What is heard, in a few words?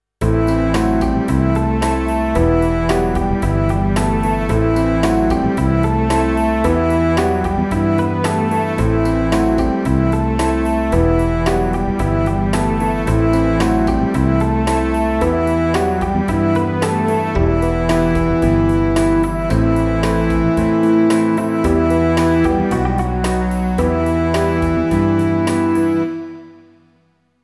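Em Riff pop ballad horn, guitars + harp 112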